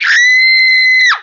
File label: female scream; cs2d; cityrp; pedestrian